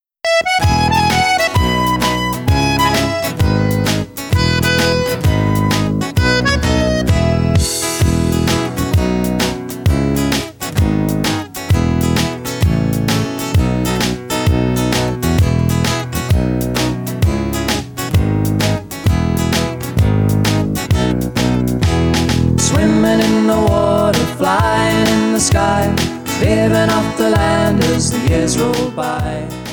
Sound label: backing; Living in Australia